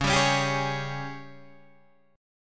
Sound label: Ab Major 7th Suspended 4th Db; AbM7sus4/Db; strummed; JGuitar